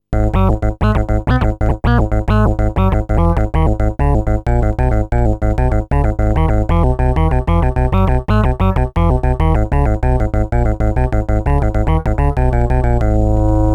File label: Glide